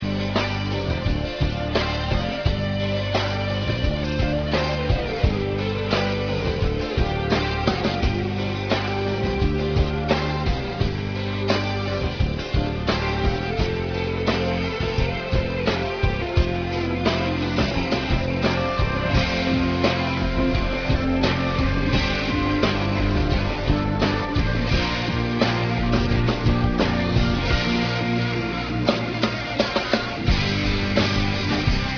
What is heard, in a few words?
sleeping strum